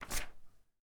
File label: Page Turn